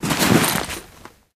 item bag drop

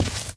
walk tile, footsteps

